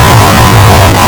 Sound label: Click Food; lolicore